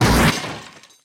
damage vehicle; World Of Warships

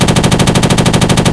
Inf Weapons; Red Orchestra 41-45

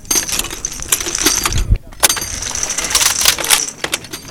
Vehicle reloads; Red Orchestra 41-45

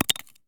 grenade collision flash russian; Escape from Tarkov

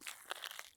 walk asphalt; Escape from Tarkov

